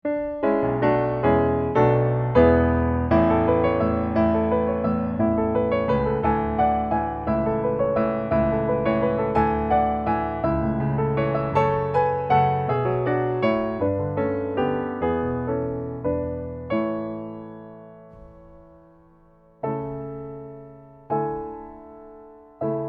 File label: Piano Track; LUCKY STIFF; Flint Repertory Theatre